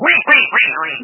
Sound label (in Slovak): Kacer